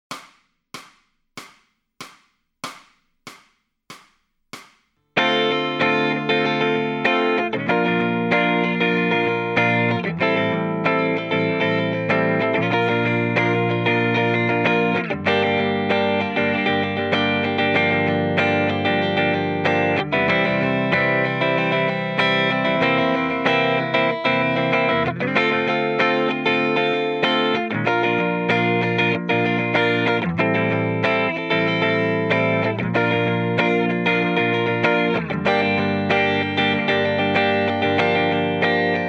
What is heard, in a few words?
Lead Backing Track, electric guitar